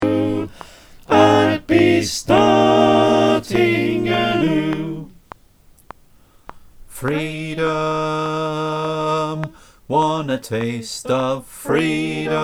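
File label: bass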